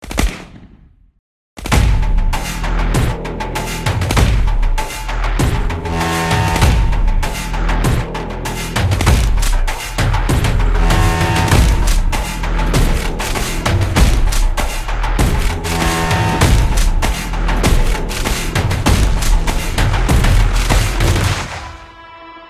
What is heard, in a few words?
intro music; ff2; jupiter